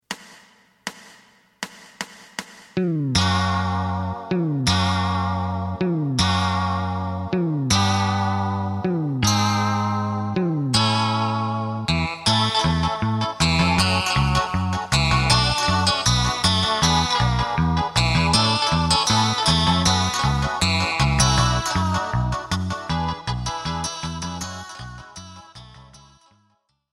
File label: drum